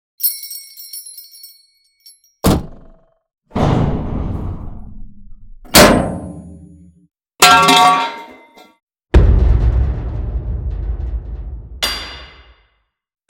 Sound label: Cinematic Metal; BOOM Library